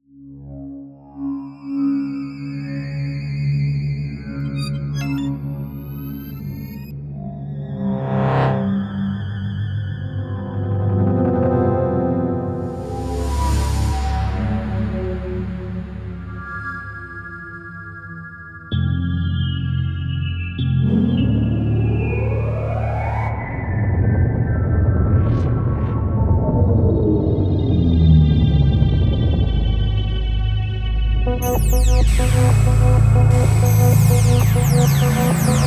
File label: cinematic